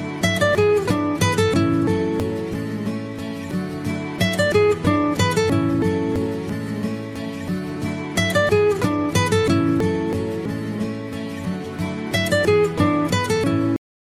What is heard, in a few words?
nokia original ringtone